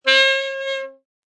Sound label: Sax Sound, A saxophone sound.; Horns; Musical